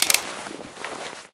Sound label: hunting rifle clip out; Left 4 Dead; Source Engine